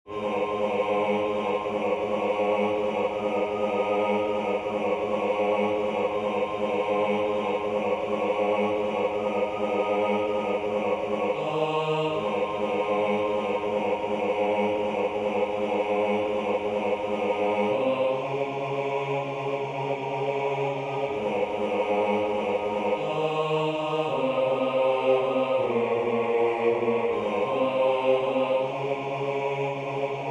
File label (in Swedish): BAS; Nu tändas tusen juleljus